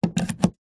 household mains plug australia plug into wall socket; zapsplat